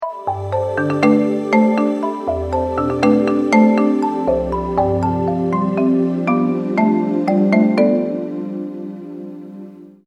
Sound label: coral Reef